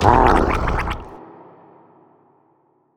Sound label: scream gurgle low